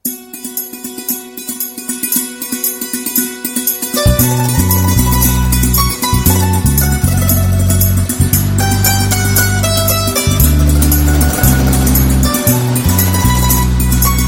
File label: Love Bgm Ringtone